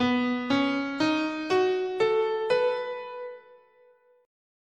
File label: B Dominant Pentatonic